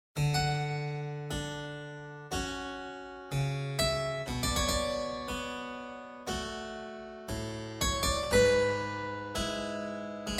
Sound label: harpsichord; margineanu